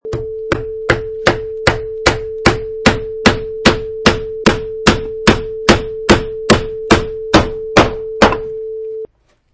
Hammer on Nail 21 Hits

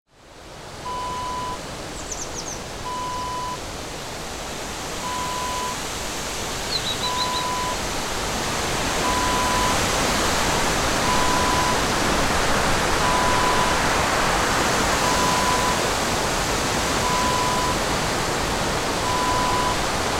windy forest ambience

